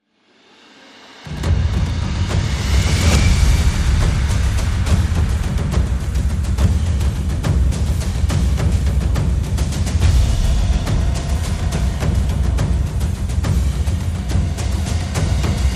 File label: Percussion and FX